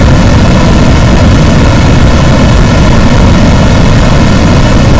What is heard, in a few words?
noise